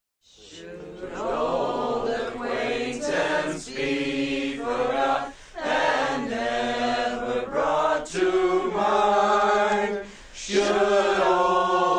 Singing, song, group of 15 friends, 'Auld Lang Syne'; occasion, event (human voice)(stereo)